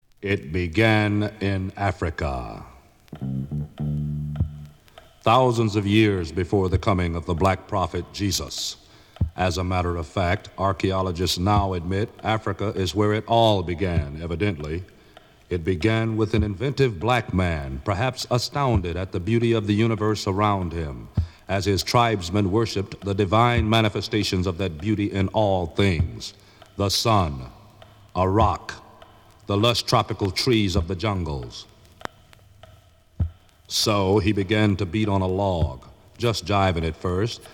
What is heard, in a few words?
DRUMBEAT